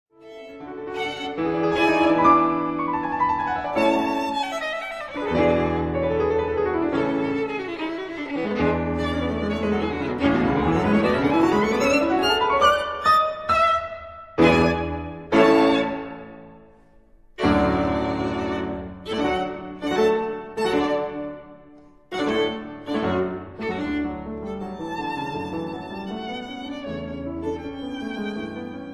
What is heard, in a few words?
Sonata for violin & piano No. 1 in D major, Allegro con brio